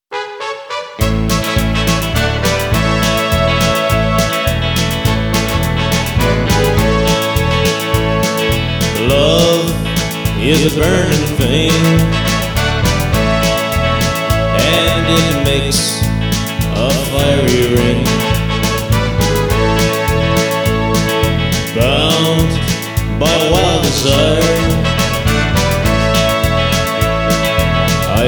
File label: Classic Rock Band